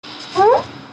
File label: farting; Ninja